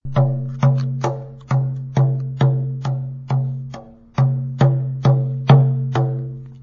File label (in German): trommel